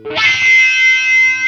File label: DIRTY WAH, Distortion Gtr; PlatinumPhattCD2; E-MU Producer Series Vol. 8 – Platinum Phatt